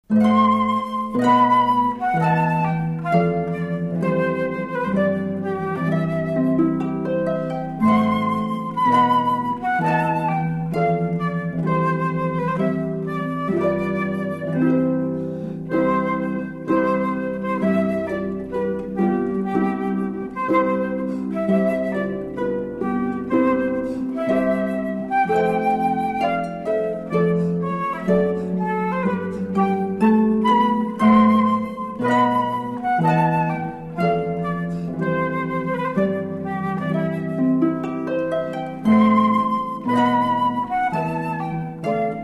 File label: duo, harp; Mendelssohn